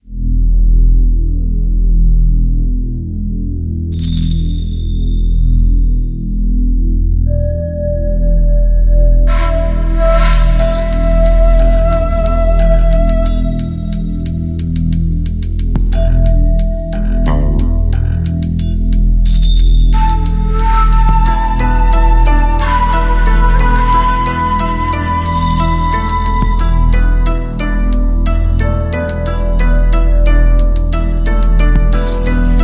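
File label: background music Damage; Naruto Ultimate Storm; android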